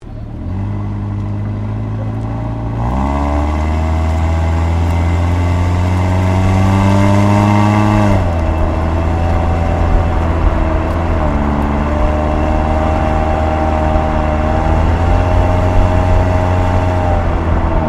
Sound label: Car Toyota Yaris exhaust drive